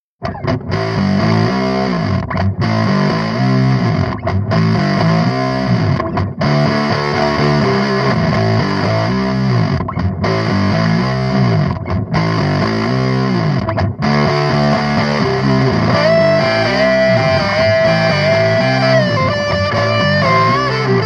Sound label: afro fuzz, pedals